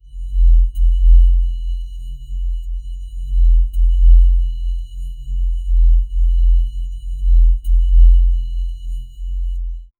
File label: produce a low gentle hear, organic